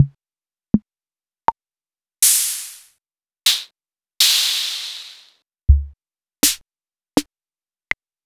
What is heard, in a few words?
Acetone Rhythm King